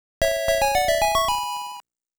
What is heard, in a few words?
Extend Jingle; Aleste Collection music album